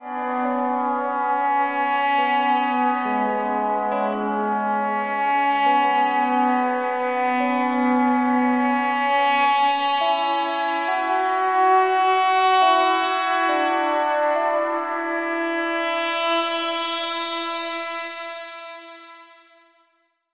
PAD Soothing Landscape; Phasewaver; syntheway

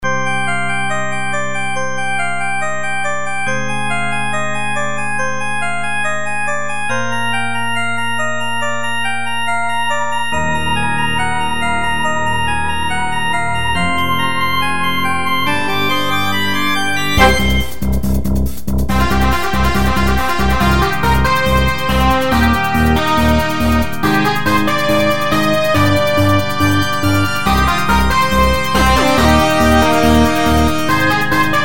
Drawbar Organ Brass Flute Bass; Garth Marenghi s Darkplace; Harmodion; Zephyrus; Bassesland; Syntheway

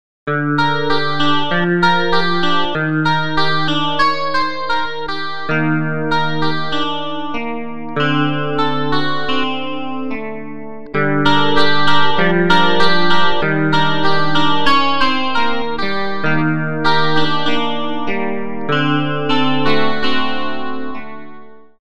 Electric Clean Guitar; GuitarTempus; Syntheway

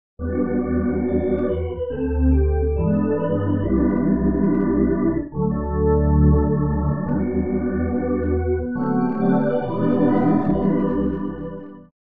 Rotary Speaker Resonant Doubler Organ Wet; AmpVibe; Syntheway

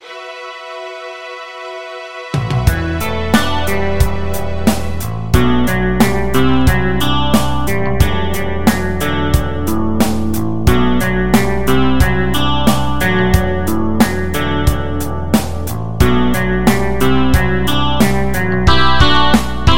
Electric Clean Guitar, Picked Bass, Strings; GuitarTempus; Syntheway Strings

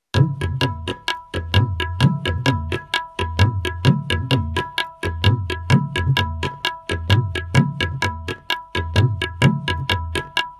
tabla loop

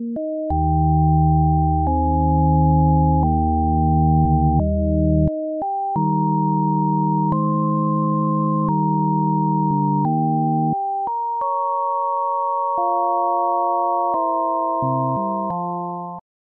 Sine Wave Organ; Synthractive; syntheway

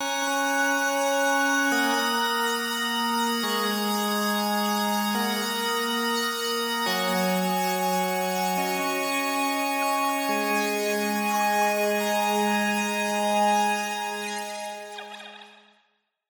PAD Glassy; Synthractive; Vision; syntheway

